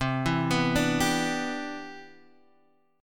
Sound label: C Major 9th, chord; arpeggiated